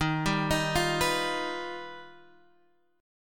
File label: G Augmented 7th